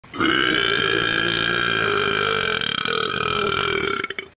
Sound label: big burp